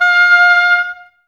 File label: OBOES F; Miroslav Vitous